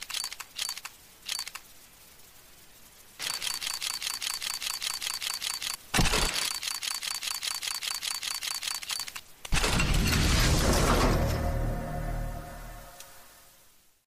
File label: roulette